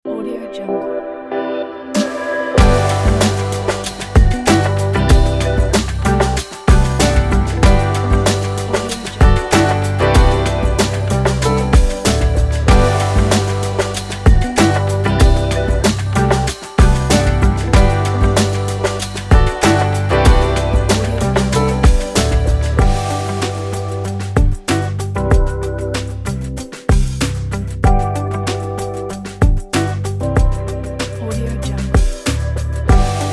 happy summer hip hop

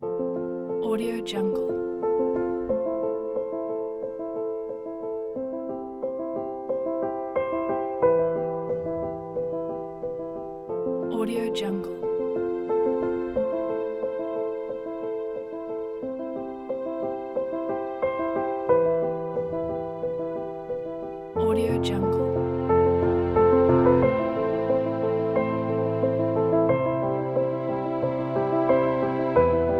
emotional piano pack

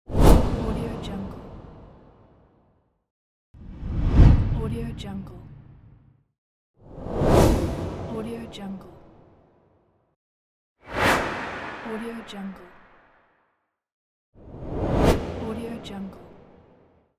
whoosh

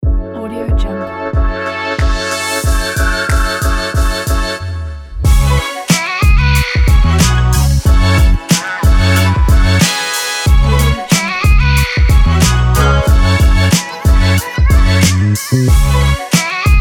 a hip hop

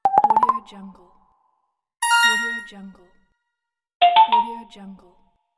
notification

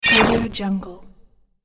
retro coin destroyed

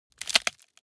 bone break large; foley; smartsoundfx

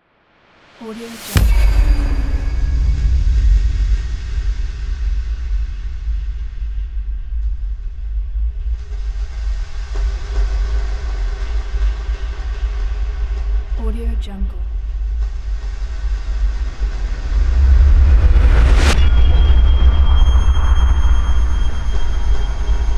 epic apocalypse scifi

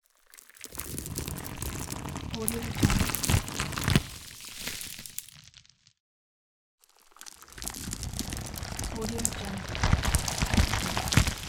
tree long growing

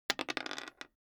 object table game die roll table long

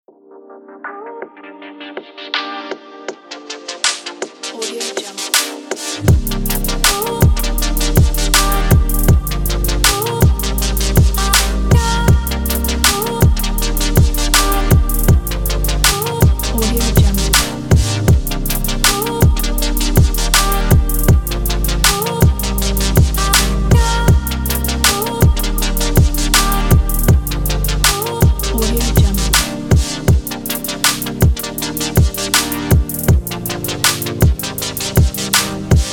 future bass

